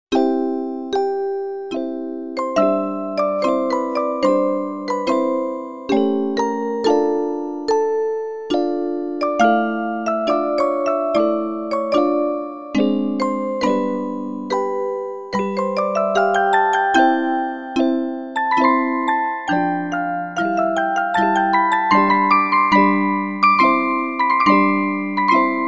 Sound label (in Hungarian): verbunk; Veszprémi Tűztorony bakonyi verbunkja